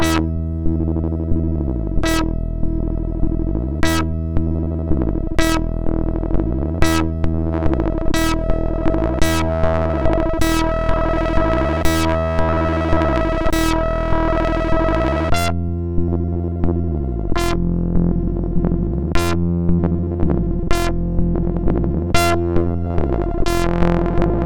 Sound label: Effekter, sid MOS6581R2; Fredrik